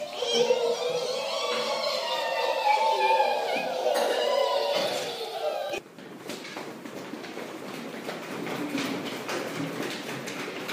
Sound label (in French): enfants